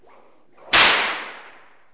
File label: methit, impacts; Wired for Sound Pro CD; Aristosoft, Inc.